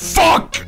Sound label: hitsound